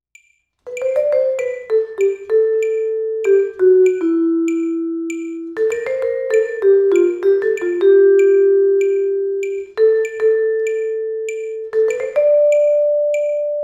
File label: melody, vibes